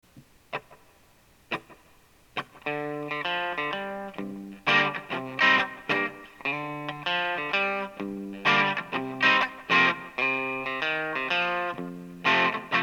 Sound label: bluesey; new strings, replaced bad part in amp